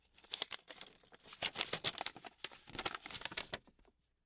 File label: Open A Paper; LOOPERS